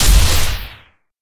Sci-Fi Weapons-Sniper Rifle Single Shot

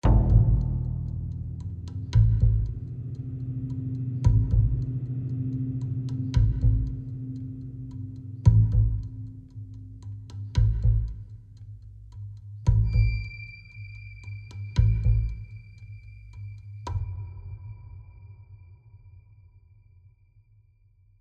Tension; AC Tension 6 Low Chunk 6

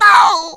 take damage large; Scrat

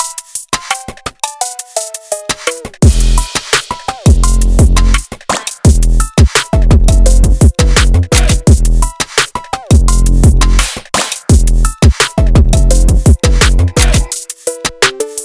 instrumental; FNF Funkadelix

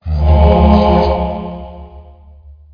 hero priest teleport

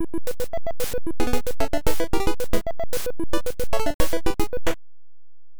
Clay Shooting Start; Vs. Duck Hunt; arcade

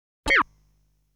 Guided bullet; legend of hero tonma

